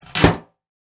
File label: Trash Bin Lid Close, Industry, Trash, Bin, Lid, Close, Metal, Large; Garbage